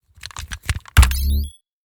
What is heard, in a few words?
future weapons mine install; future weapons 3; soundmorph